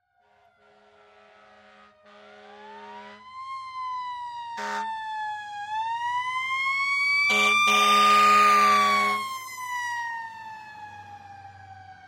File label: Fire Siren; SOUND EFFECTS1